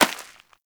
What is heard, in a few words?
Rodent Hop Step Rear Dirt, Footsteps; Forest Rodent; Foley; Warframe; Characters